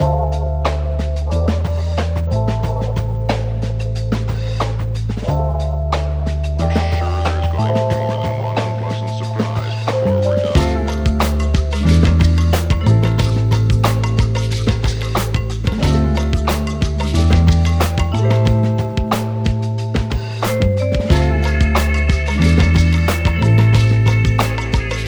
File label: Hip Hop